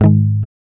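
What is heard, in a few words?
GUI G#, acoustic guitar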